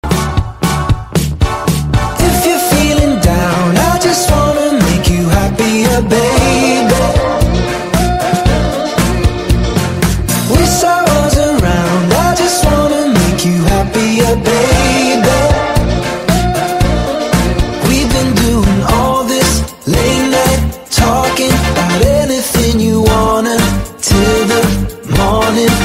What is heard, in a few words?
English Ringtone